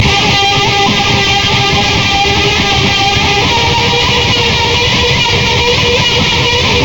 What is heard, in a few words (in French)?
Guitare